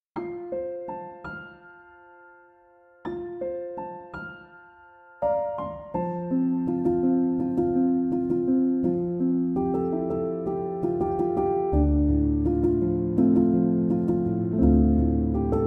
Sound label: Piano Instrumental